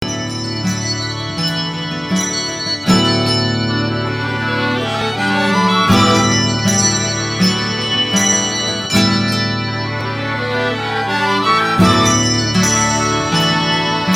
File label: Guitar